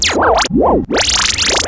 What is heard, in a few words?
system repair start; Earth and Beyond; EA Games